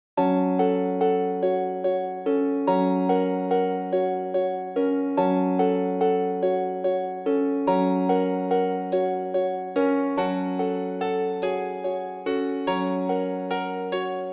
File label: sop1, midi; britten ceremony